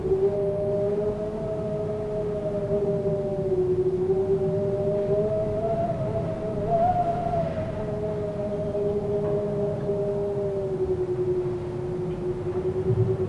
weather wind apartment moderate strange whistling door gap moan argentina loop; sonniss gdc